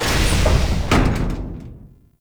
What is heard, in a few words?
Portal Entrance Door Close; Duviri Murmur; Octopede